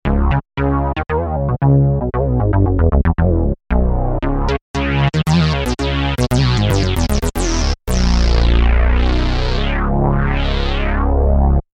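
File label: 4 Saw Fat Bass; V-Synth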